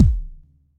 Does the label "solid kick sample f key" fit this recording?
Yes